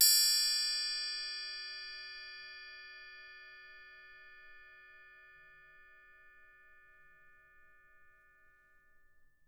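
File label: LG TRI left, percussion; Miroslav Vitous - Percussion & Harp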